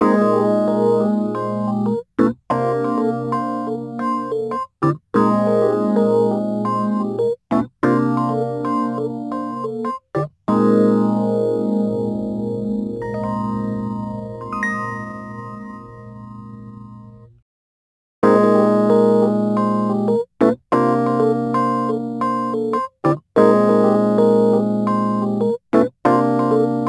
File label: Rhodes Attacks; Ueberschall